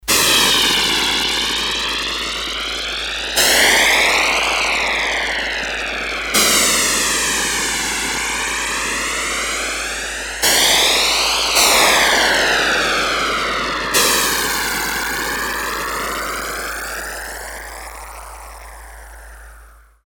Steam Fry, Korg DS-8; Factory Patches

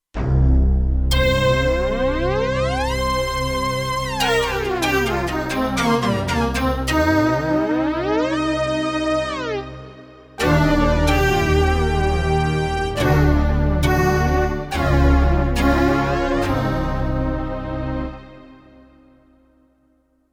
Poly Portem, Kurzweil K2500X

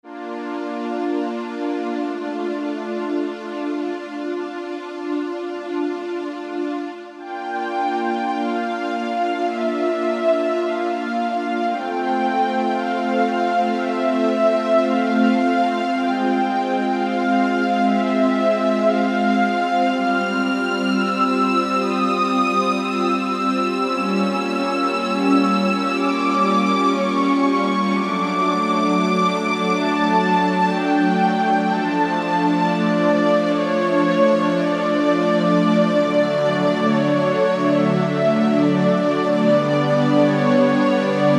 OB Strings; Roland SC-880; Factory Patches

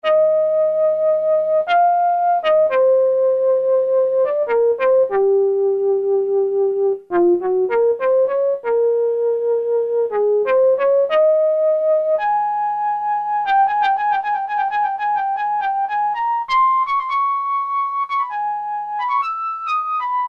Windy Flute, Roland MKS-70

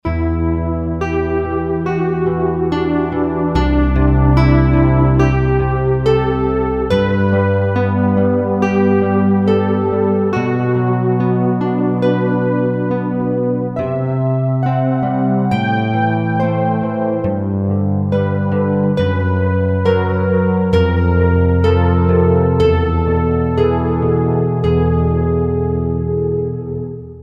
Atmosphere, Roland JV-2080

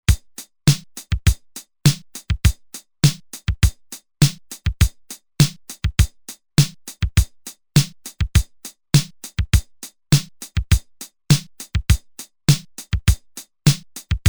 8 Beat Rock, Roland TR-505; Factory Rhythms

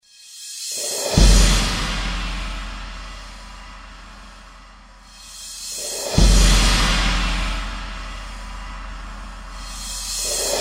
Horror Hit C4, Roland SR-JV80-16 Orchestral II

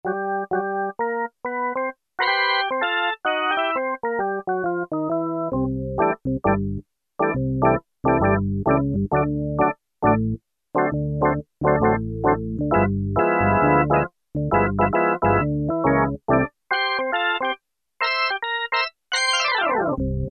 WARM ORGAN, Casio VZ-10M; Internal factory combi

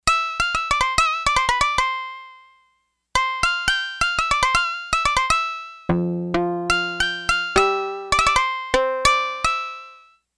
Syamisen, Yamaha DX11; Factory Preset Voices

